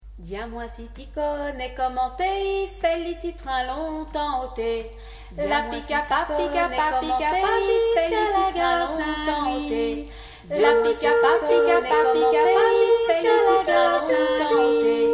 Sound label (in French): Canon 3 voix; Le ti train